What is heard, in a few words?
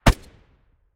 fiveseven silencer mono far, weapons